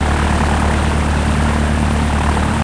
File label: Prop; Learn to Fly 3